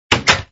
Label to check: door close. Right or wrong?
Right